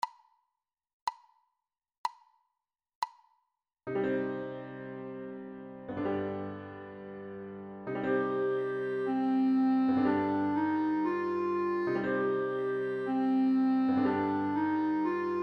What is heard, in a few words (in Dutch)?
met klarinet